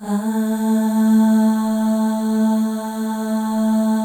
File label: AHS, FEM; Spectrasonics; FEM.2 AHS